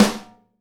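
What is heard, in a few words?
snare bottom DAMP, Live Snares; E-MU Producer Series Vol. 8 – Platinum Phatt (CD 1)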